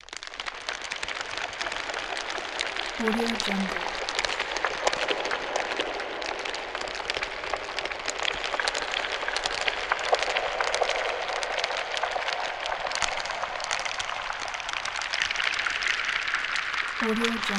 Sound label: scary cave ambience